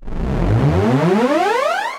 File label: Wide Air Riser